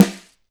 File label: Snare, Alesis DM5